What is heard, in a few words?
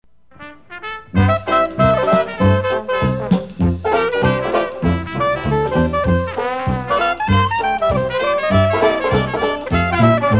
dixie jazz